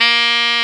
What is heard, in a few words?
SAX XSAX, Sax Sect.; Saxophones